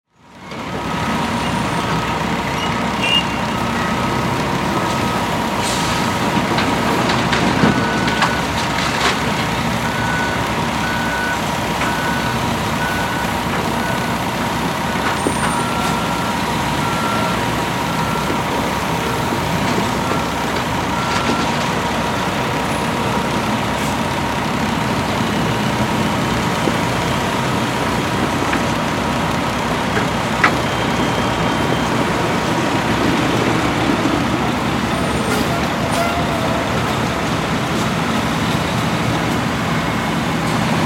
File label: Ambience, Construction, Vehicles